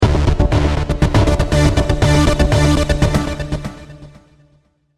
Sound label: Electronic arpeggio logo for many uses including news reports.; Breaking News; Musical Sound Design